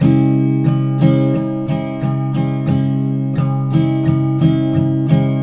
TK MIN D, Guitars; Spectrasonics; Hans Zimmer Guitars VOL-1

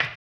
HAMBONE; Blues & Soul

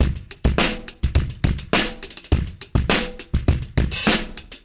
Beat Loops, 103; Zero-G; Monster Beats

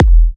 E kicks; markus hakala kicks

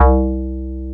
C Bass

